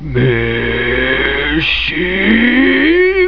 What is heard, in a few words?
Synth sound; Homer 0.94